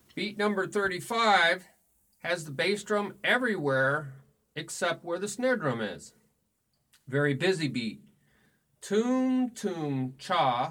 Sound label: Beat 1 2 3 4 Toom Toom Cha Toom Toom Toom Cha Toom